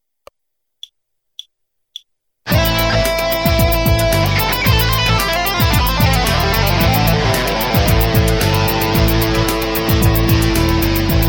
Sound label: lick, guitar